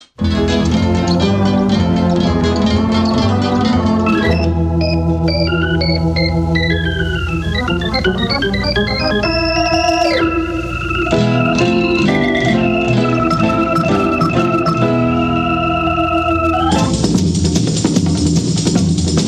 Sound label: Organ